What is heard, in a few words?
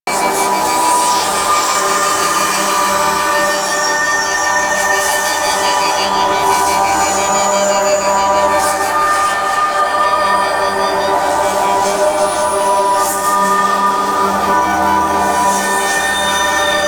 Shrine Loop; Warframe; Teralyst; Eidolon Plains